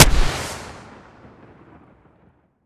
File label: rpg fire far; vj weapons